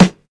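hot snare drum f sharp key, snare tuned to the F# note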